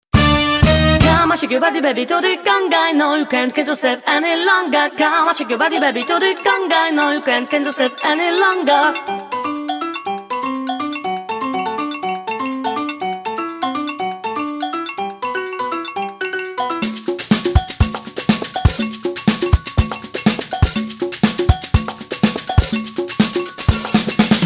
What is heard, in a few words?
Conga